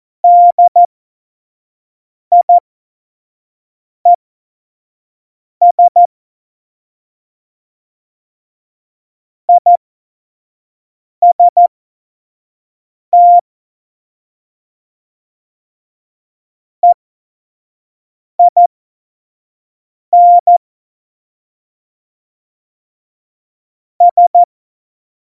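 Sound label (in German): Witz, cw morsen; Morsekurs; Witze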